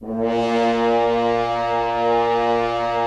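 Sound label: Solo French Horn; Roland - LA Composer vol. 4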